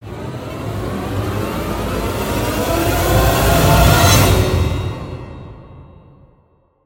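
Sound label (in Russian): эффект нарастания; demonikon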